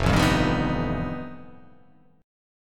F 9th Flat 5th F down

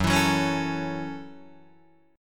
F sharp Minor 7th F sharp, Guitar Open D

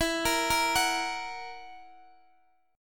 E Suspended 2nd Flat 5th E down Guitar DADGAD; Esus2b5; arpeggiated

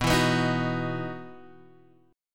E Major 7th Suspended 2nd

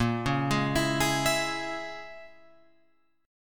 A sharp Minor 7th Flat 5th, Guitar, Open F; arpeggiated; 10,8,8,11,11,11; JGuitar

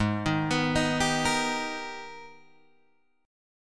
Minor Major 9th, Guitar, Open G; Gsharp

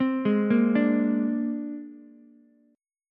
C 7th Suspended 2nd Sharp 5th, C, down, Ukulele; arpeggiated; chord; C7sus2#5

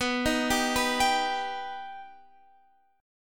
B Augmented B Guitar Half Step Down

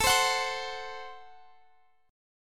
F sharp Diminished A

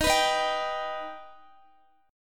D sharp Augmented D sharp Guitar; D#+ strummed; x,x,13,16,15,14

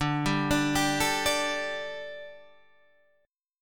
D Suspended 4th D, down, Guitar Standard; arpeggiated

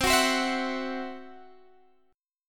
C Major Flat 5th, C, down, Guitar, Standard; strummed; CMb5

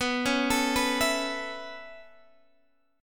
B 11th Suspended 2nd, guitar chord

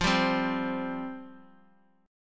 F Suspended 2nd, chord; Fsus2 strummed; JGuitar

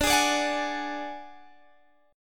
D Major Flat 5th D Guitar Standard, chord; strummed; JGuitar

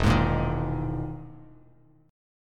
E-Major Sharp 11th E, Bass Standard; EM#11 strummed; JGuitar

